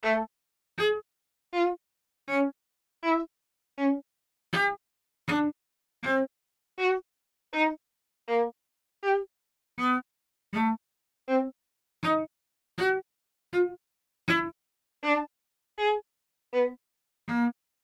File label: strings, chimeras